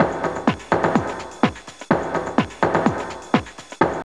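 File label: drum loop; X-Static Goldmine 3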